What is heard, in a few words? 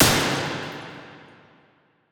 Impact Strong Hoot Fast; Impacts Cinematic